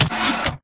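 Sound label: Stalker Movement Servo Damaged Head pivot Short; Titanfall 2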